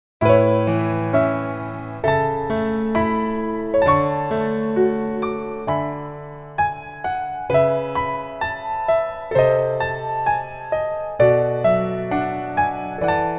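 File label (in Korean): Piano version -1키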